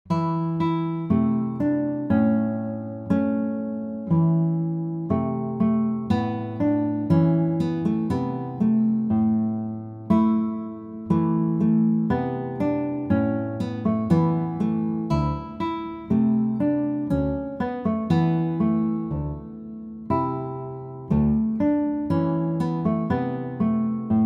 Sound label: Five Fingers Solo, guitar